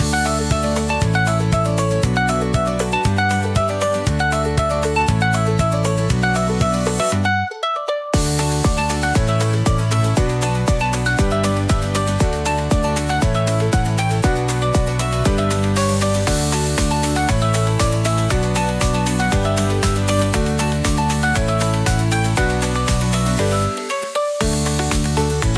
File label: Happy Electronic